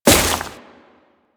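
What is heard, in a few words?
Crewman Step Vegetation, Footsteps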